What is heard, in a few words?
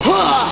huah